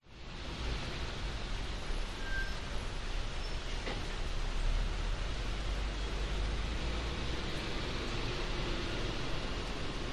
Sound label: public places urban industrial estate inside shop by open door rain thunder; passing cars